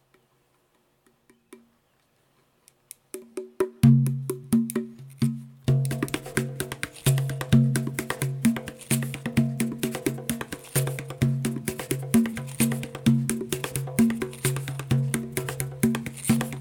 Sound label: Hand Drums 65BPM; bertjerred